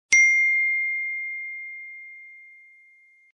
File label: Iphone 15 Notification